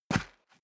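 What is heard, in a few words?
step boots single concrete grit single stair down, footsteps; schritte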